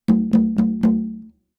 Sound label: Conga, Latin Percussion